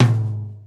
00s mid tom a key, tuned to the A note. Loudest frequency: 464Hz; tom drum